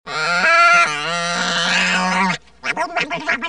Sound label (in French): Canard, Animaux; bruitage